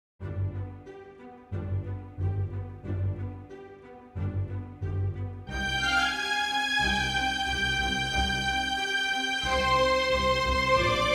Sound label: Waltz